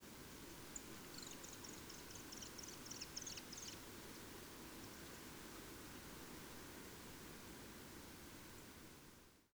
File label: predation